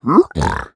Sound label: piglin admire